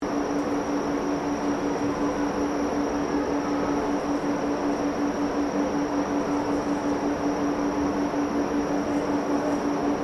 PULLEY SYSTEM MINE CABLE IDLE LOOP, Colonial era machinery; Machines